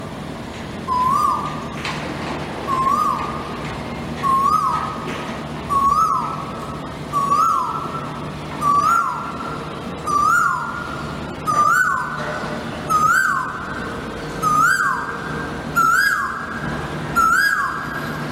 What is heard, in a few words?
Koel bird